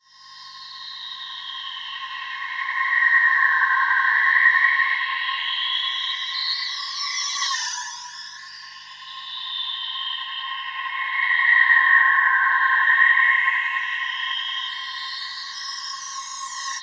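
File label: FLYING SAUCER, Anime Sound FX, FLYOBJECTS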